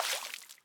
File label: stomp water end; Scrat